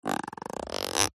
household comb fingernail run across teeth

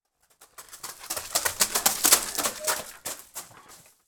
foley footsteps running shingle children pass

